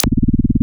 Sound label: Noise Hit; noise hiss crackle; Noise Hits